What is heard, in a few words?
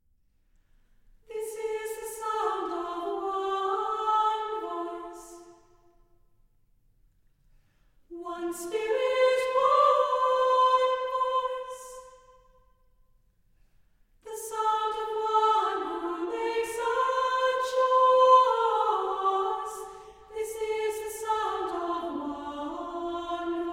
Chamber Singers